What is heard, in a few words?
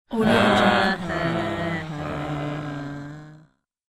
mixed group depressed sad; human sounds